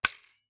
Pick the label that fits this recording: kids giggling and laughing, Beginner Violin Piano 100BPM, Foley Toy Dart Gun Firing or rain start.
Foley Toy Dart Gun Firing